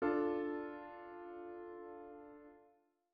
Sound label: D min triad